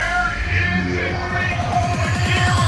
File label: Is Calling Rap